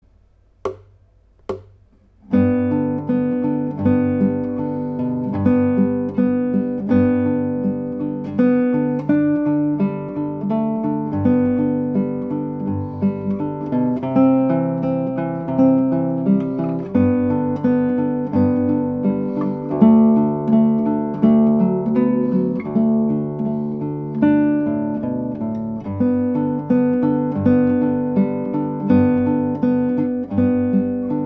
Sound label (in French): vive le vent; pour Antoine et Vianney